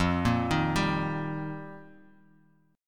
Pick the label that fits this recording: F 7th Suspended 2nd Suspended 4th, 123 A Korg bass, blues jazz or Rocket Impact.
F 7th Suspended 2nd Suspended 4th